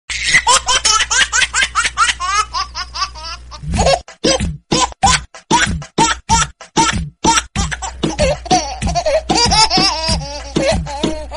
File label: cute funny baby laughing ringtone